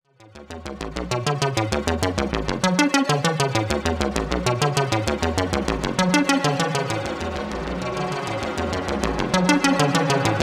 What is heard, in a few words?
spring freestyle